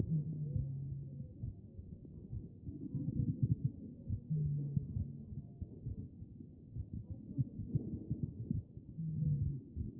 A CHEST; B MODE

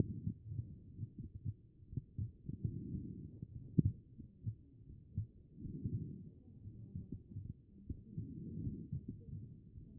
HEART; B MODE

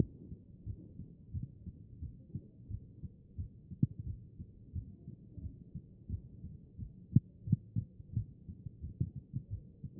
CHEST; B MODE

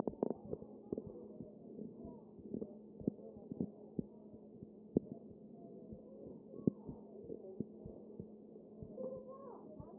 CHEST pos2 D MODE

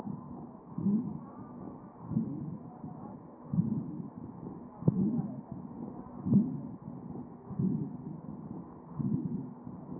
CHEST

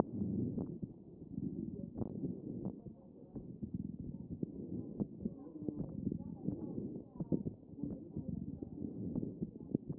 CHEST pos4 D MODE

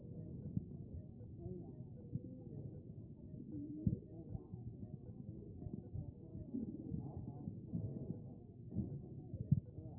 CHEST pos4 B MODE

